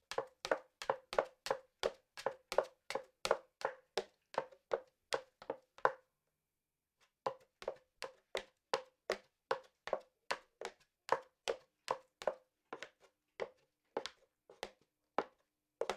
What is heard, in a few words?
Running On Wooden Floor With High Heels